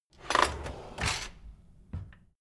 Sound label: drawer kitchen close loud